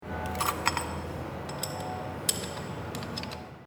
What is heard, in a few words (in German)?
Spannfutter auf Scheibe